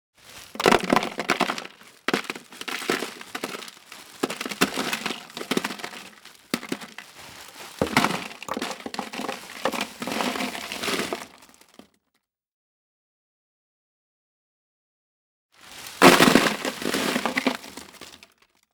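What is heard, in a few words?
ice being poured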